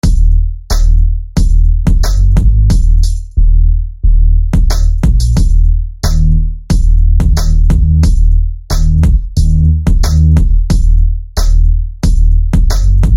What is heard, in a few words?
Red Burst Bass; SFB FX